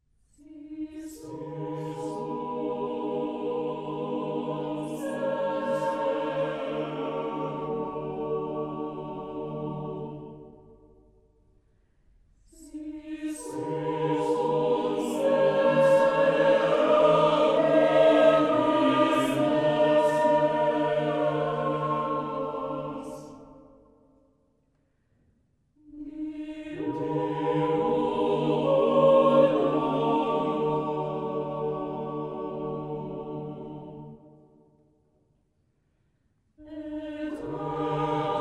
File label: Three Liturgical Choirs; Urbaitis